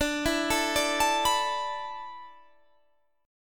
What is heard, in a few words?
D 6th Suspended 2nd D, chord